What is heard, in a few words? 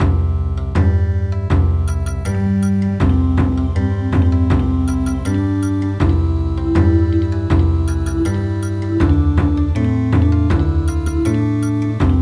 Bon Odori, midi